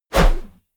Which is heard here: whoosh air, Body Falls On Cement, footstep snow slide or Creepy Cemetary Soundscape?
whoosh air